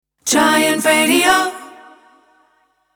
Sung Jingle Radio 118bpm Dmin Acapella; Giant Radio

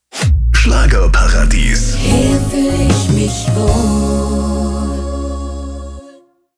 Schlager Jingle Sting

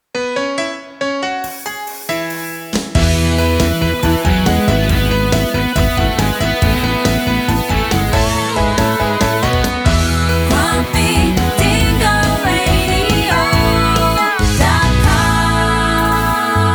Radio Jingle Ramp; Grumpy Dingo

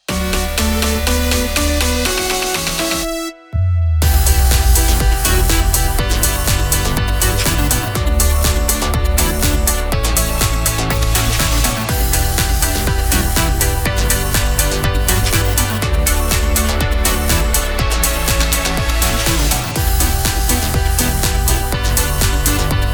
Sung Jingle, 122bpm D Major, RAMP; KLove

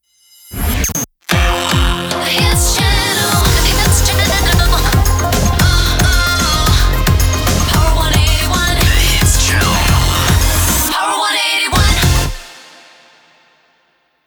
Jingle Theme Slogan; Power 181

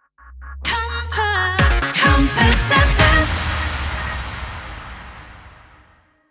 Sung Jingles Eb minor 128bpm Sting; Compass FM

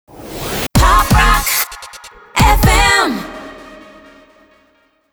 Pop Rock Jingle Sting

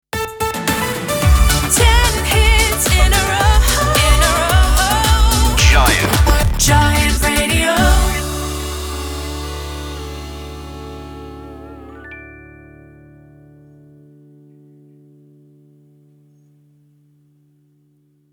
Sung Jingle 110bpm Dmaj Slogan; Giant Radio

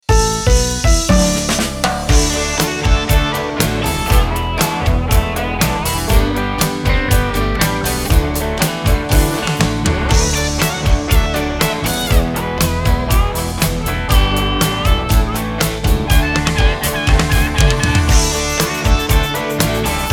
Sung Jingle 120bpm D Major RAMP; KJIC 2020

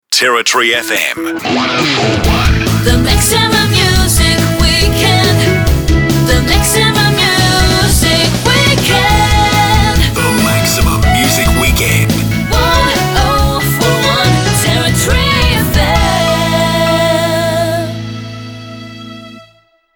Jingle, Slogan, 140bpm; Territory FM

